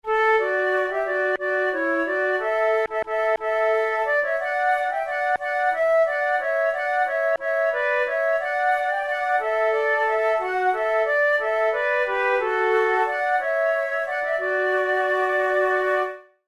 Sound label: duet, flute